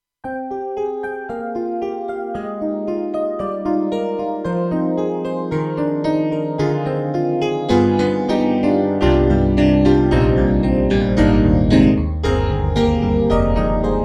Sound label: Roland JV-2080 Piano Blend